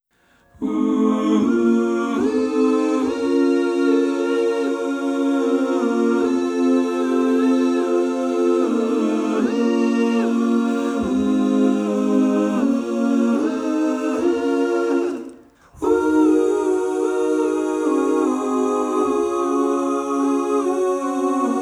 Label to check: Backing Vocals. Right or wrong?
Right